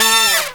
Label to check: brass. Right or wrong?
Right